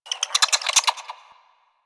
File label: Bird Clicky; Warframe; Ambience; Eidolon Plains; One Offs